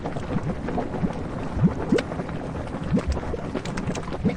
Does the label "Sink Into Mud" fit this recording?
Yes